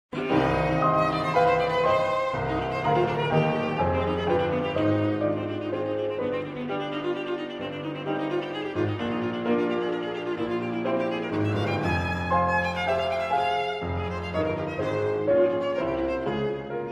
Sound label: etude, viola; vieuxtemps; schlussminute